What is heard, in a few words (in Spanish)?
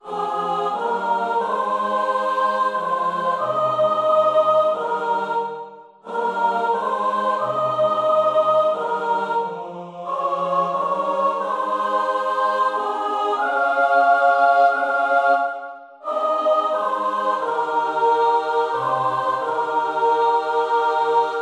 Soprano resaltada